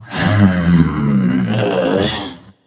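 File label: nonverbal grumble; smite; voicepack; merlin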